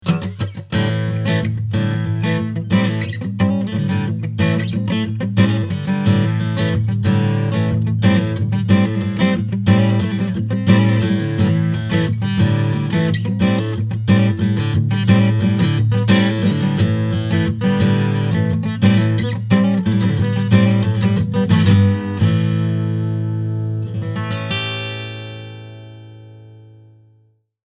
Blues Picking

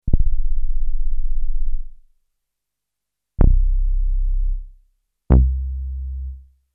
closed filter VCO A low; Cybersound T1

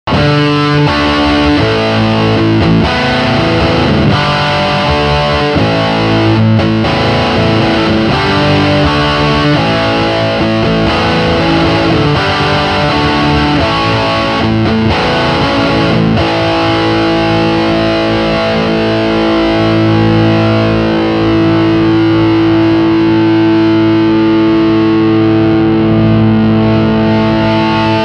Heavy Distortion

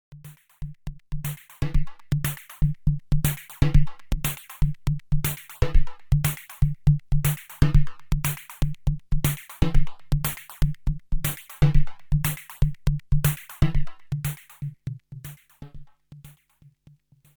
Drum Shape

